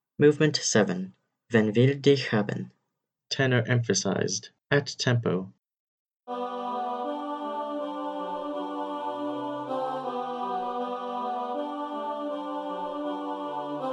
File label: tenor emphasized at tempo, choir